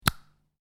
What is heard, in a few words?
Lego Connect Two Lego Pieces; Miscellaneous Toy Sound Effects